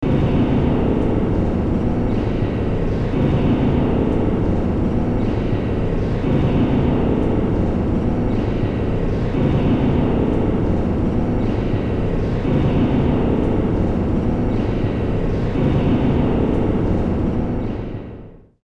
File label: Desert Cave Ambience; A Dry Heat; The Legend of Mata Nui; Game Music; Console Games